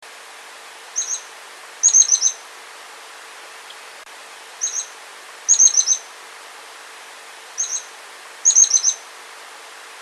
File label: Actitis hypoleucos